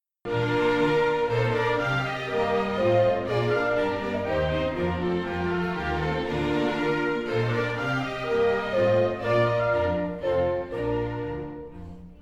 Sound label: Fagotto Con Bf